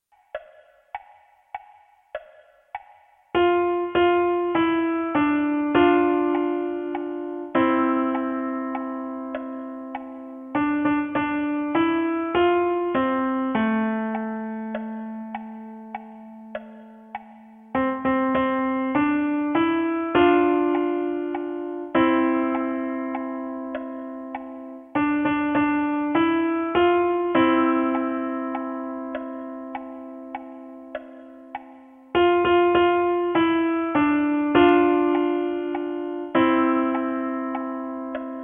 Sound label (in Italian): piano unite